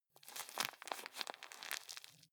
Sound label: juggle squeeze